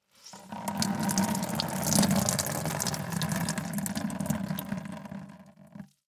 Towel Wet Ring Out, Towel Wet Ring Out Into Bucket; Bathroom; Household; Blastwave